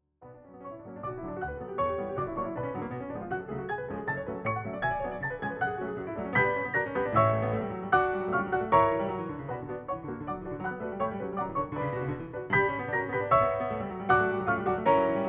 Hungarian Dance in D Major- Andantino grazioso – Vivace; brahms; 21 hungarian dances; WoO 1